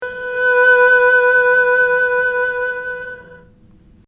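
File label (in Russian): скрипка воздушный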